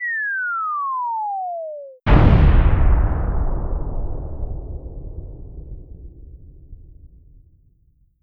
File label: bomb falling and exploding; mechanical